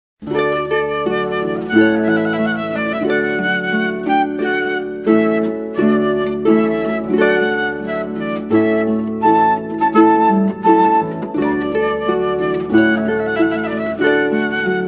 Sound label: duo flute harp trumpet voluntary; clarke